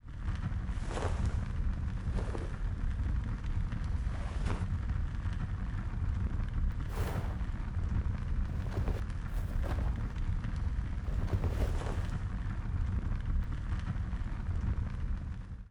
Altar; Yesterday Origins

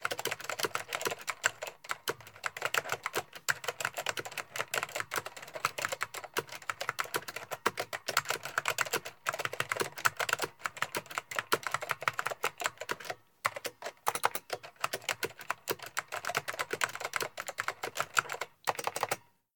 Typing

